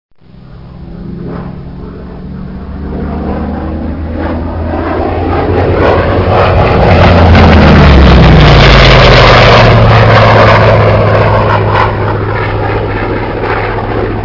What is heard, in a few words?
f4u corsair, avions